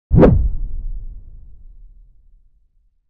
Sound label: sword swing; 攻撃